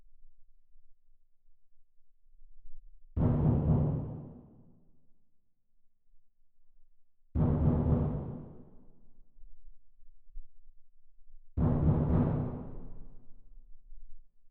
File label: Perc